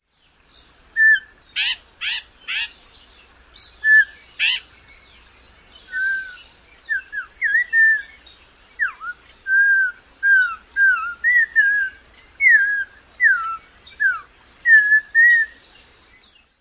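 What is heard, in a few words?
Audubon's Oriole calls and song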